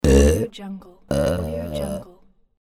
burp

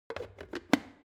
object kitchen plastic water boiler lid on; smartsoundfx

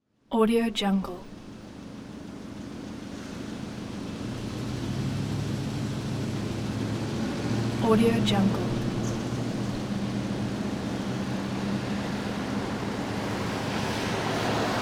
city traffic

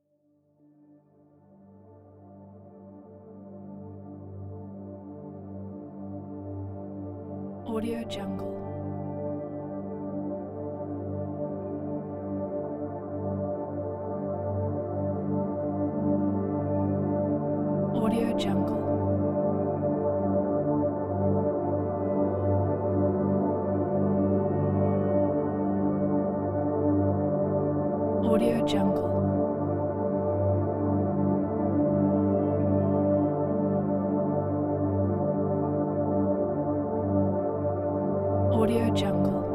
meditation soundscape

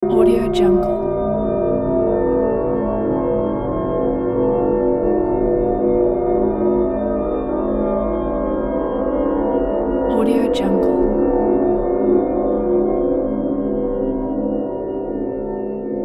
dark sinister mystery

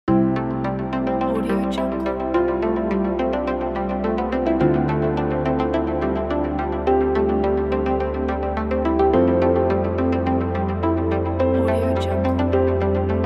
a soft medical

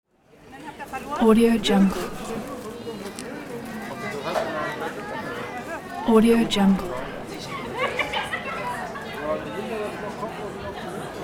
people chatter

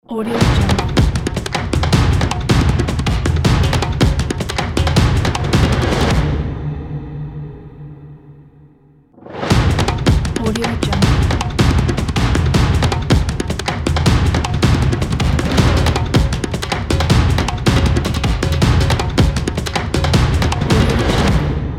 african drums logo

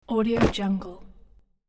grab and drop leather jacket on floor

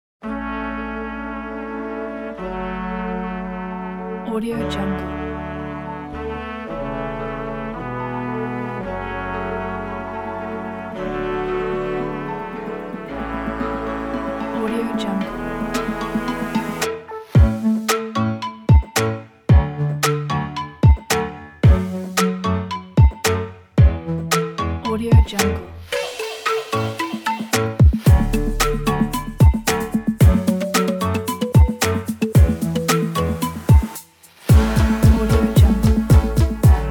happy upbeat corporate pop

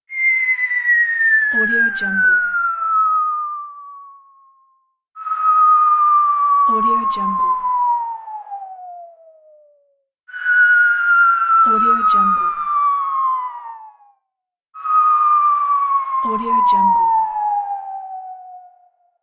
whistling bomb

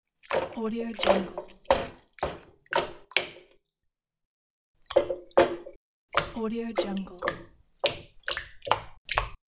putting dumplings into the pot with water; dropstudiomarket

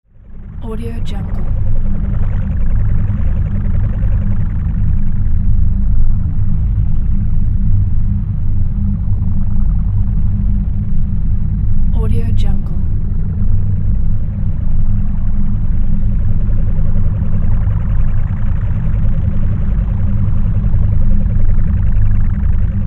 low rumble

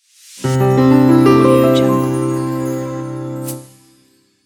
piano logo

